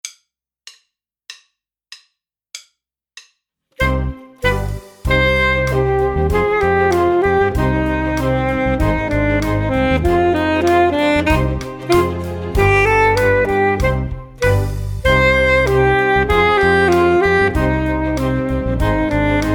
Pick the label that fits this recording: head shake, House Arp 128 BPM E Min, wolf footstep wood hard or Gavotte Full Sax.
Gavotte Full Sax